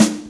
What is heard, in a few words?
SD, Dance Drums